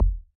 Ball Collision Geo Tackleball Extra Light

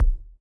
Ball Player Hand Hit Tackleball Light

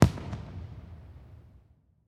Celebration Fireworks

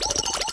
Tech Points; TechTree

